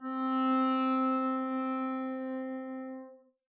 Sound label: arco c4 p down, double bass; dsmolken